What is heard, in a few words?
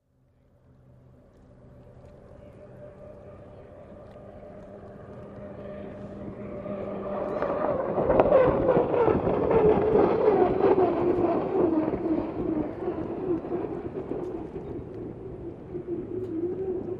Hydroplane By Ambie